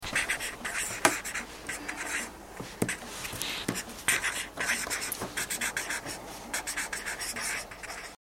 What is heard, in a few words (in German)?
schreiben mit einem filzstift